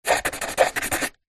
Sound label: office pen felt tip sign signature on thin wood